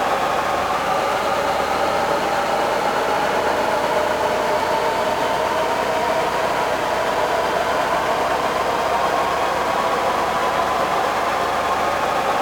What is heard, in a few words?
Shoes fast Loop